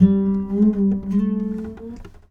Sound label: Sarangi, Acoustic String; Sonic Foundry (Sony Creative Software); Friendly Fire